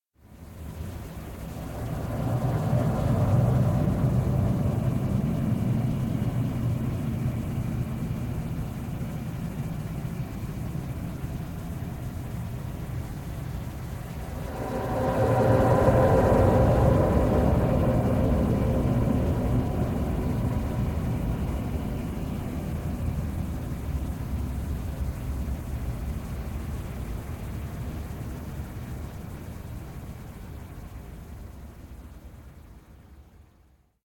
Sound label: Unsettling noises; SyrinScape; Waterdeep